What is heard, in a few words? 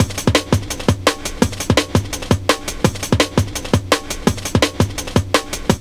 LOOP, Explosive Jungle